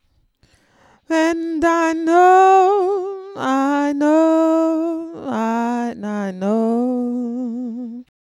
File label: lead vocal